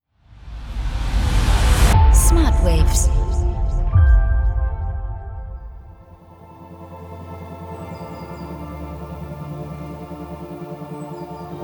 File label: Harmonic Waves; alpha all in one; ALPHA pro